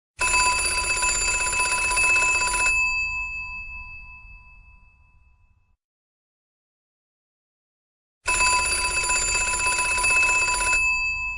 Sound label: phone ringtone